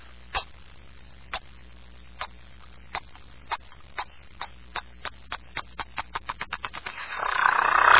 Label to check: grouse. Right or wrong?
Right